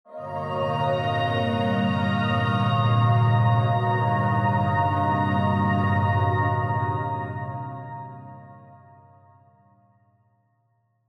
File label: Synth Swell, Synth Swell Ethereal; Musical; Blastwave; Sound Effects:Musical